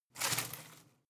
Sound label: home plant leaves rustle heavy short